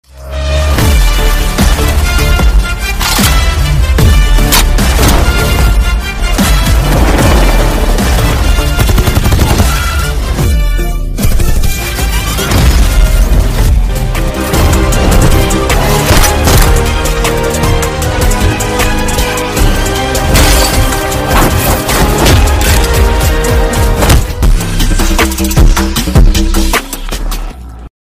trailer bgm ringtone; thalapathy vijay; venkat prabhu

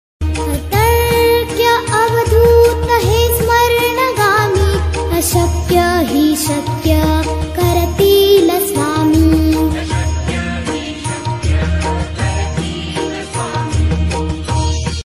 marathi ringtone; mobile phone ringtone

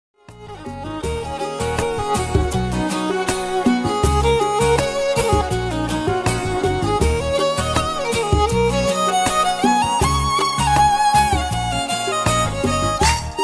melody bgm

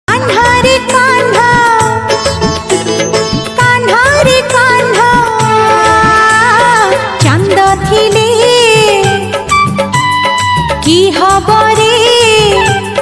odia bhajan ringtone

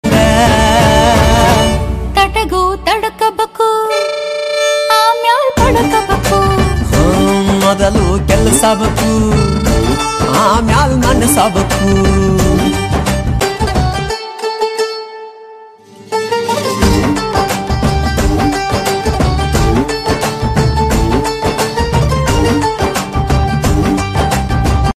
ringtone song; karataka damanaka

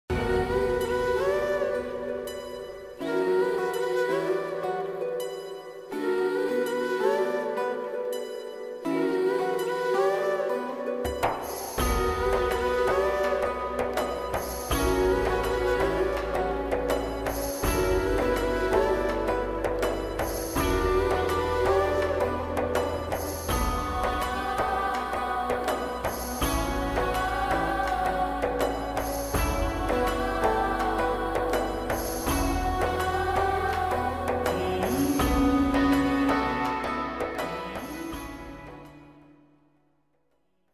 instrumental background music flute; khuda aur mohabbat season 3

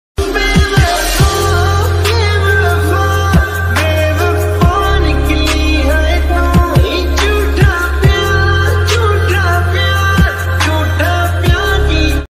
fake love breakup bewafa heart touching sad love

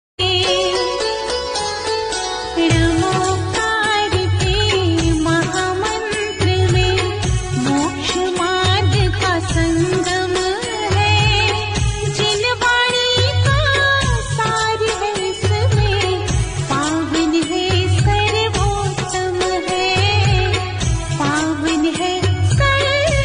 navkar mantra, jain; namo arihantanam

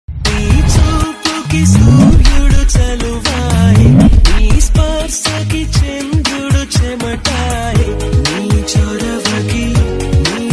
old songs melody; venkatesh victory

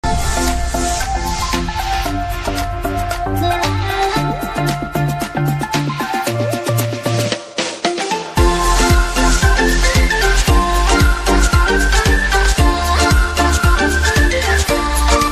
song instrumental ringtone

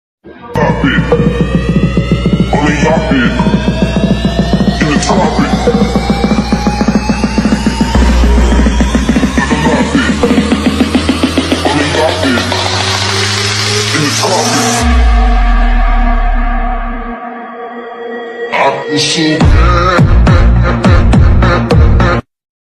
english bgm ringtones attitude boy ringtone

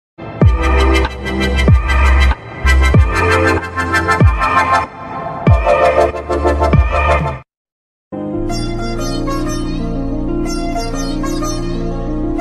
telugu love ringtones, telugu love songs

